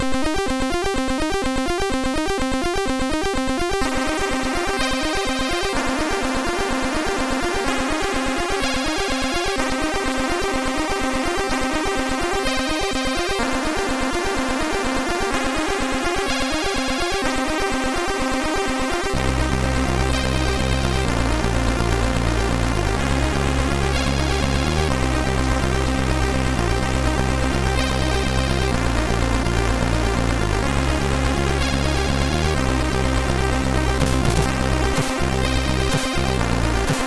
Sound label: Sad Story, MOS6581R2